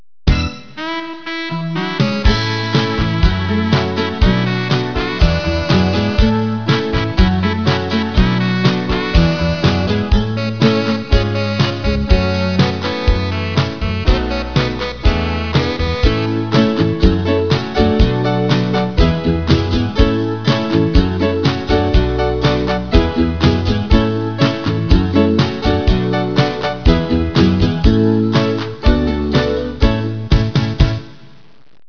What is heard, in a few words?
break up